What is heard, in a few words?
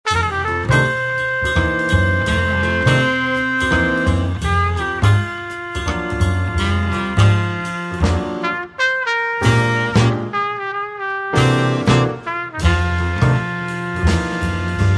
groove